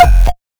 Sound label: kikk, kick